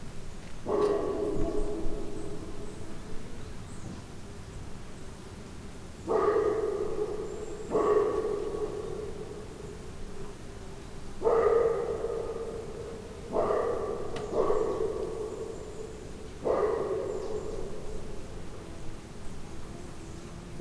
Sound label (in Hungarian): tavolban visszhangozva ugato kutya; Egerturistaház; termeszetben